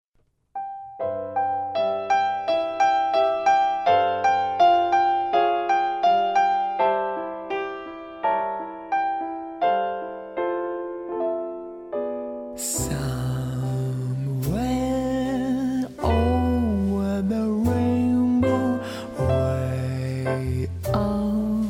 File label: Chillout Jazz version